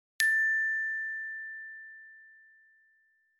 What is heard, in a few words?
bell ding